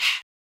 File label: Chants